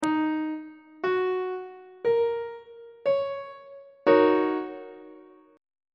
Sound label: f sharp major 6th 3rd inversion; on treble clef